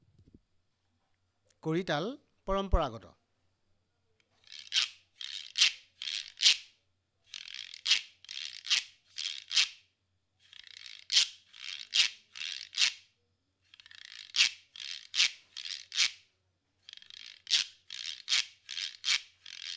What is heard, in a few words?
Kodi Taal Assamese Traditional; Brahmaputra Cultural Foundation (BCF)